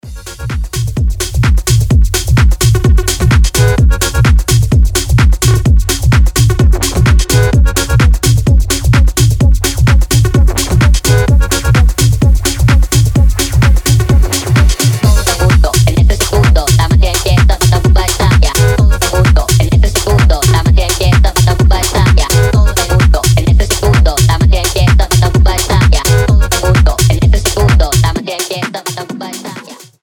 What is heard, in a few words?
accordion song